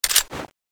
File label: clipout, weapons; bloodhunter